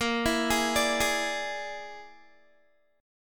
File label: Bb 7th Flat 5th Bb, Guitar; Bb7b5 arpeggiated; 18,19,18,19,20,x